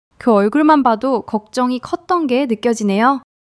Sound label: laughter